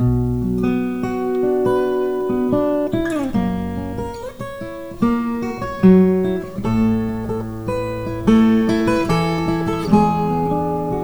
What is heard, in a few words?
unsigned 8 bits PCM